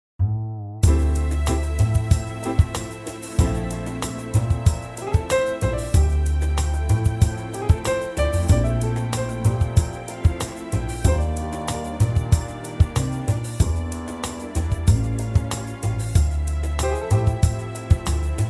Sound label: groove Dm